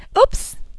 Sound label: fall